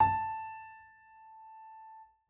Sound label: piano a; Golden Crayon Sounds